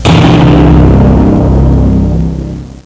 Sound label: boom; selection sound of when you actually select something; SH demo